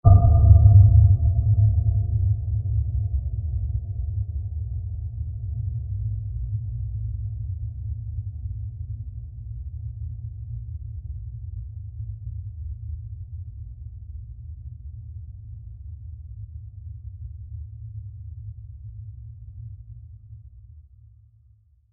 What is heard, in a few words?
Deep Mysterious Bell Impact; Low Impacts; Sound Effects:Impacts; CraftedSignals